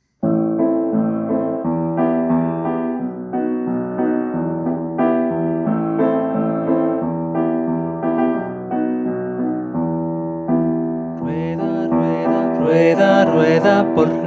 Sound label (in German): Männer; Rueda rueda